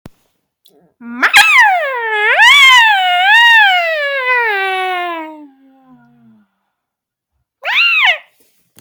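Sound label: maaaaaaaaah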